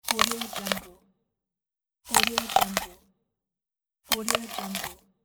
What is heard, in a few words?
biting an apple